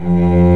SOLO STRINGS

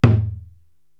Taiko Open, Korg TR-Rack

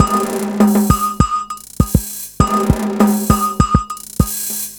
bottle and drum, 100bpm

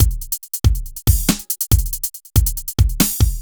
1D140 SUN1, dry Loop, BPM140 Dry

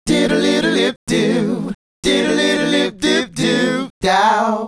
Male Group, Hip-Hop and RnB Vocals

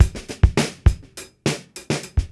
Beat Loops; Zero-G; Monster Beats

